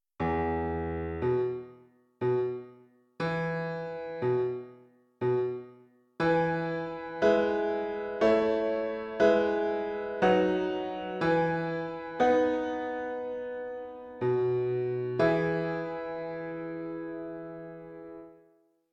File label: Piano